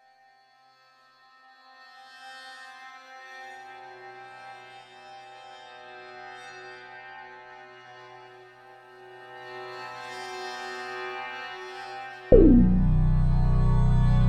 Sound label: Pro Shop Strings; CSI Miami